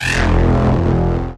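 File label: Creature Laser Beam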